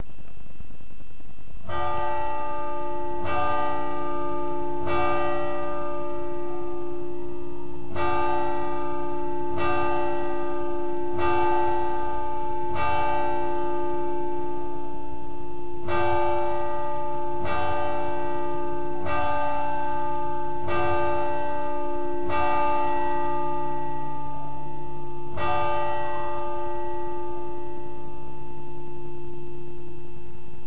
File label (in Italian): campane Mattutino